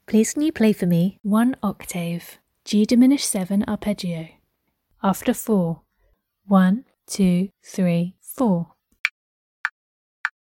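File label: G diminished 7th arpeggio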